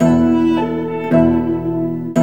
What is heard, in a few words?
CHINA, Ethnic; Zero G - Ethnic; CHINA VOL #4